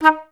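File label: flute staccato D#3; Best Service; Peter Siedlaczek's Advanced Orchestra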